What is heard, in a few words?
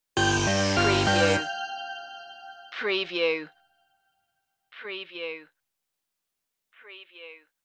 big softee stinger